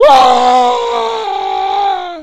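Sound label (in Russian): кричащий звук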